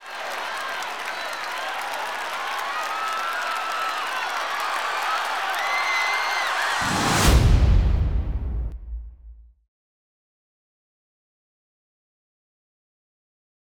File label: SFX; RINGSIDE SIZZLE; TV1